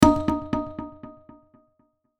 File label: Hit Kalimba, Hit Kalimba Drum Delay; Blastwave